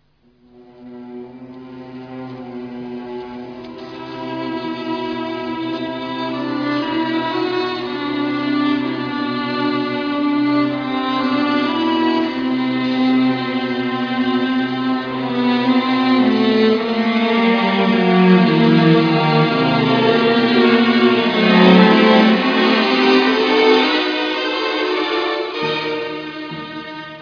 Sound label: Simphony